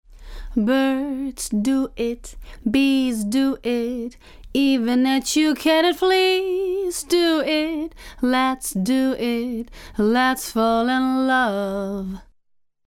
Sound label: Vocal Comp Heavy